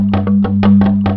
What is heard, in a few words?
BAMB TREM G1; Big Fish Audio; Synth City